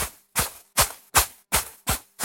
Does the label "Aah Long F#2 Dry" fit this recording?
No